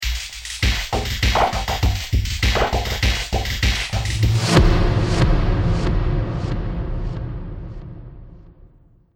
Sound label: Radio Stinger; sound design; Radio Stinger 14; Stinger Sound Effects